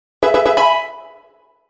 Level Up!; Pokémon Black and White

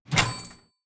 Notification Alert Coins Buy Shop

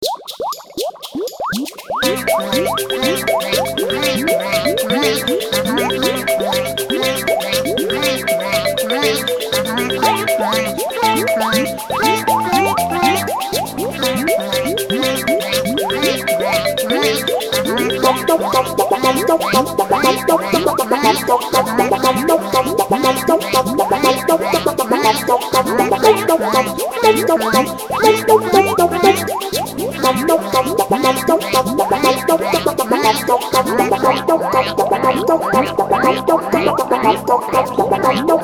Level Theme (Water); sticky balls; gizmondo ios

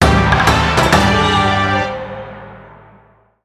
Jingle Game Start; Spooky Spirit Shooting Gallery

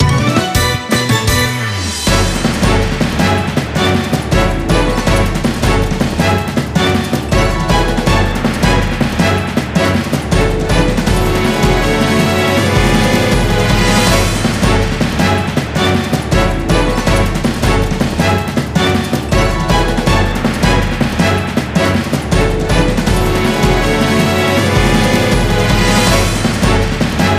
Music event

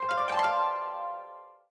Gained Lesser Item Stinger; B Path of the Teal Lotus

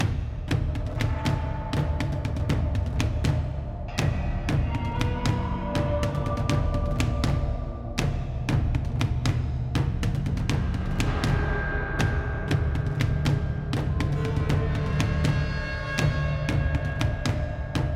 Spooky Ship Loop

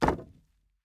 wood land; teardown; ps5 windows xbox series xs

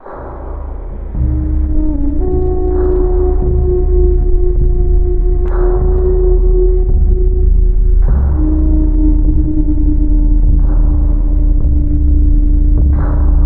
BGM; Adventure Player; psp

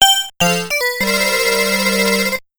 Round Start; Pac-Land

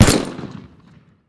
tools gun

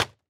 plastic hit

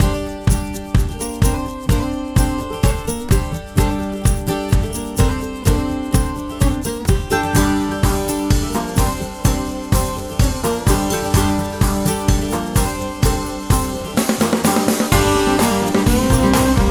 loop rampage; Western

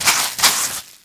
Wet Paint; Levels Hub Orleans; Draw Wallpaint; Wet Paint Brush

